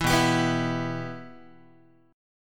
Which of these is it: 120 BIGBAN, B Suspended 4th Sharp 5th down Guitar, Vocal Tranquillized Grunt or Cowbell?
B Suspended 4th Sharp 5th down Guitar